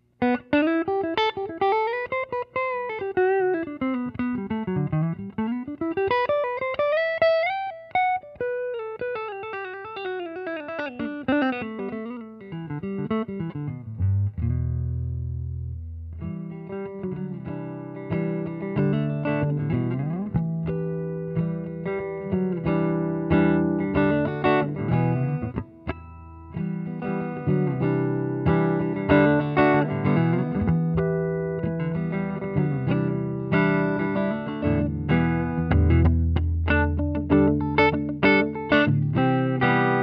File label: Knuckle Harmonic; EASTMAN; DR. Z MAZ JR AMP; Cascade KnuckleHead